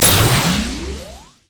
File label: accel; LoL; jayce